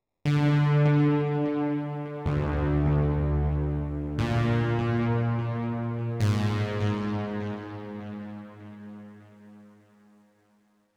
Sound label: Oneshot Synth; Zombie Army 4 Dead War